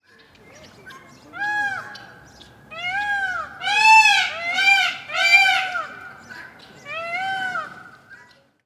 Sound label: Peacocks Singing; افکت صدا حیوانات; Animals